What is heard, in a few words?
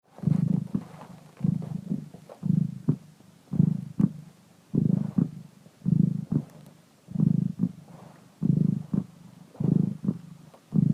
cat purr